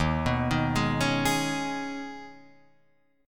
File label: Eb 7th Suspended 4th Sharp 5th, Guitar, Drop D